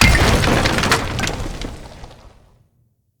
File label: Knights Path Floor Break A; Champion Crypt